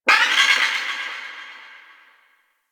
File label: Sentient Lair Exterior Creature; Warframe; Ambience